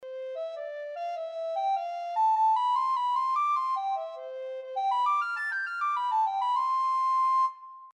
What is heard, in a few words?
Descant Recorder